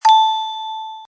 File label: roll